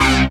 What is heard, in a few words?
dance chord